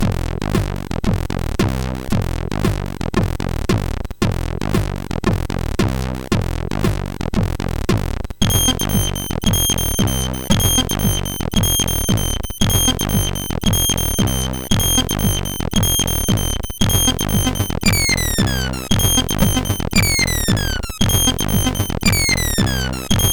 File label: Techno Groove, MOS6581R3; hvsc